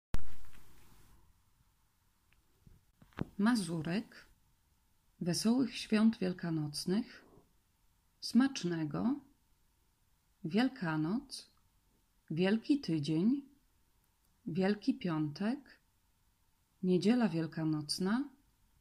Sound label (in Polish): Mazurek